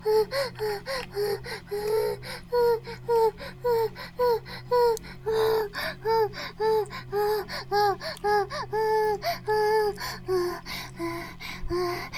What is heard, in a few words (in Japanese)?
バイブ放置; イクたびにわけて